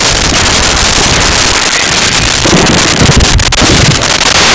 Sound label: Blow Up; worms